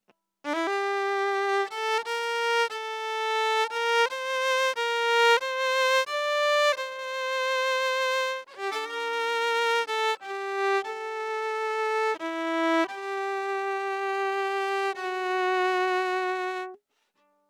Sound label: Violin